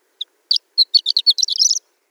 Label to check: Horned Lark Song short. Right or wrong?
Right